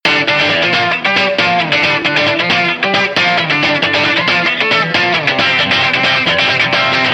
intro music

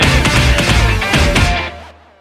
outtro music

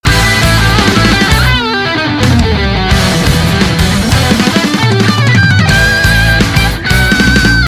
outtro music win

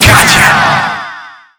teleport; freak fortress 2; robo shitcake

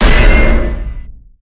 ultimate break

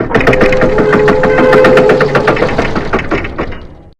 slam; gearmaster

